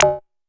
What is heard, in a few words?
Zap; Drumset 03